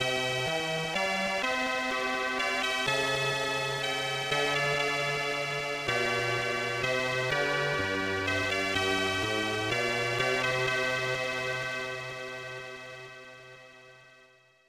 National Anthem of San Marino, SNES; Nigel Mansell's World Championship Racing

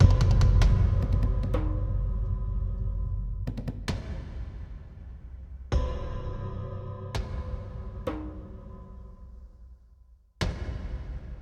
Tension Low Short Loop; Sniper Ghost Warrior 3 2017

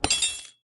Drop Knife

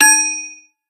Tickets Available; Angry Birds

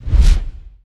Menu Backup; Lucius III

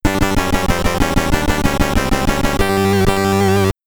Trumpet, SID

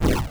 SOUND CANNON SHOT

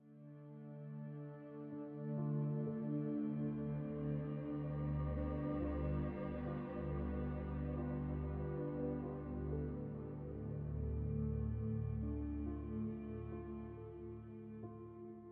Music Giants Loop; Frozen Flame; windows

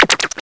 SFX Get; hydra castle labyrinth gamerip 2011

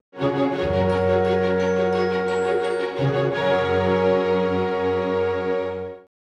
Exploration; heroes of might and magic ii 2013

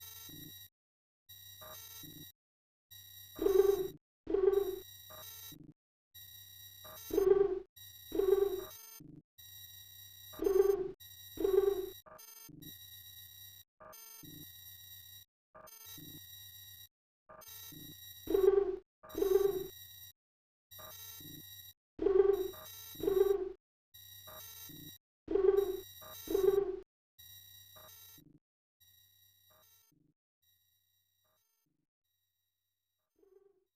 Night Ambience; der langrisser

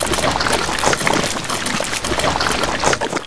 mission pods Attack Egg

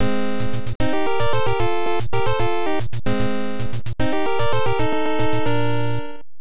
Start BGM; super xevious gamp no nazo; family computer; arcade

